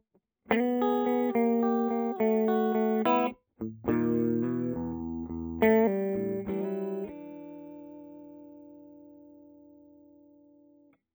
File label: clean middle blues; guitare; vintage electric